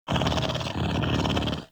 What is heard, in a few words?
Pet Purr; Enemies; Warframe; Catbrow; PetShip